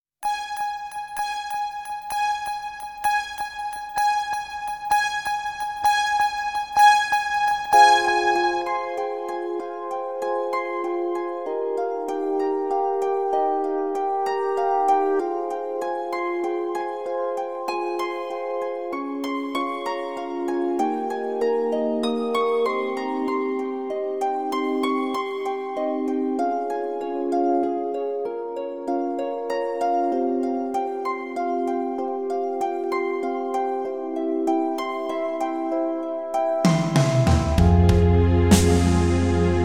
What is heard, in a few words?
brass minus